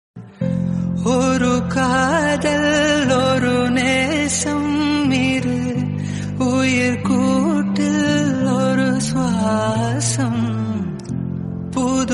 tamil ringtone

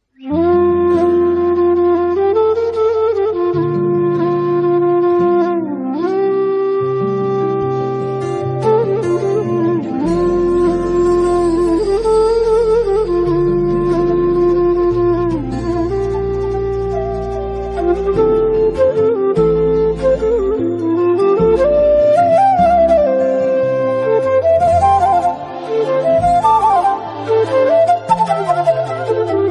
Krishna bgm flute bgm ringtone kannada

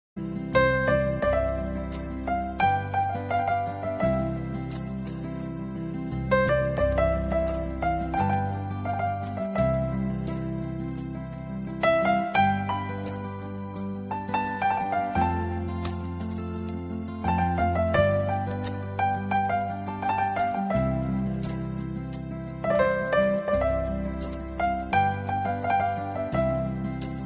ringtone old evergreen song instrumental ringtone

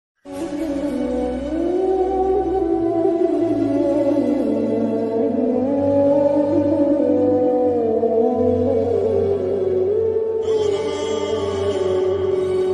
broken hearts sad song alone ringtone

